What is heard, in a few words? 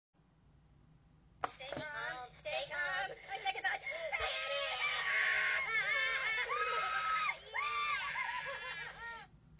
panic button